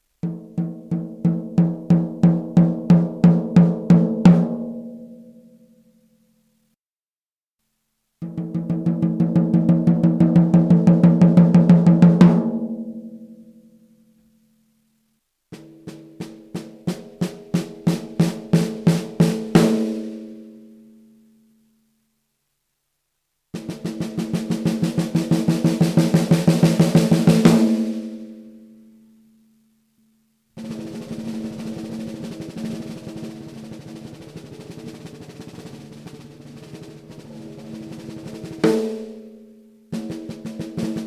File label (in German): 14x65 Cherry Snare Recordings Tief